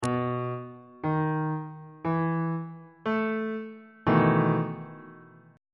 f flat major 7th suspended 4th 2nd inversion

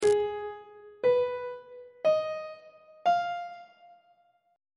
a flat minor 6th chord intervals on treble clef

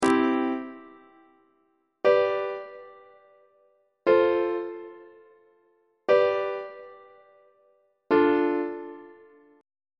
c major deceptive cadence on treble clef

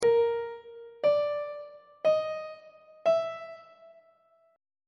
a sharp 4th interval pitches on treble clef

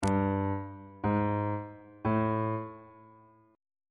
e flat 4th interval pitches on bass clef

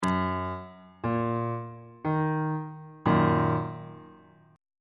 d sharp minor 1st inversion on bass clef

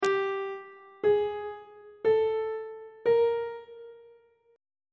g 2nd interval pitches on treble clef

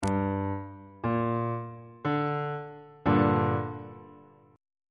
a double flat minor chord on bass clef

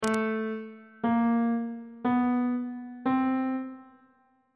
b sharp 7th intervals on bass clef

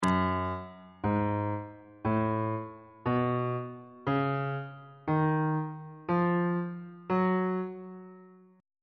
f sharp melodic minor scale on bass clef

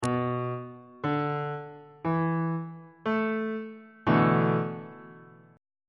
f flat dominant 7th suspended 4th 2nd inversion

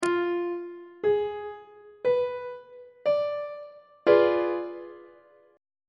a flat diminished 7th 3rd inversion; on treble clef

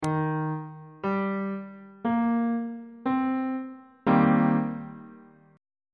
d sharp major 6th chord on bass clef

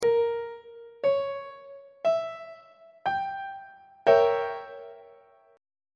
d flat diminished 7th 3rd inversion; on treble clef

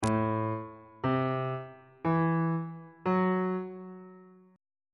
g double sharp minor 6th chord intervals

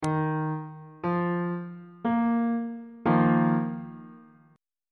b flat suspended 4th 1st inversion on bass clef

